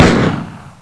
magnum firing

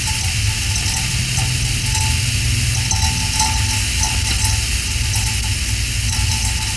radiator

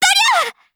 charge shoot; ganyu

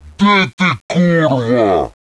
attack, troll

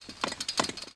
wheelchair move

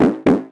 bouncy loop

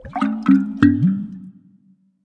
water cooler

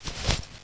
weapon deploy

